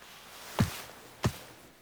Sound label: Foley Operator Step From Frame; Warframe; The War Within; Queens Chamber Scene Eleven; Cinematics